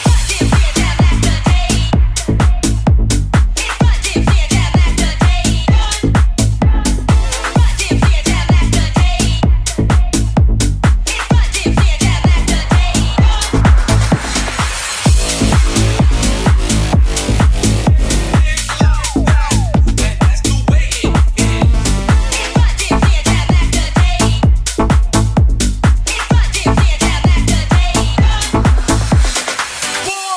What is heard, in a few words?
128bpm E Tech House